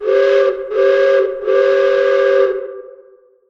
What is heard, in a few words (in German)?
dampflokpfeife 3 mal kurz